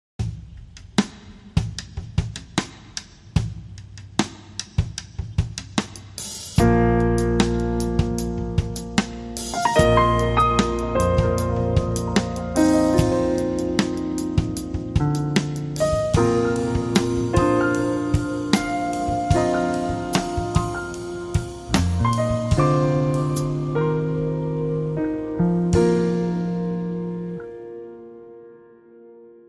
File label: Jazz Trio